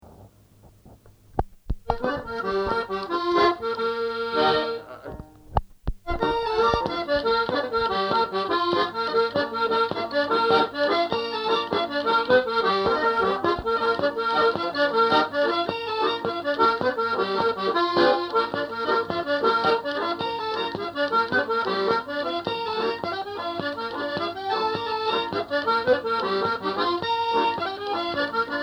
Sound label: jigs; Ship in Full Sail